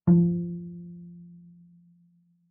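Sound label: Bass pizzicato fortissimo sul D F3, Double Bass; MIS Pitches - 2014; Strings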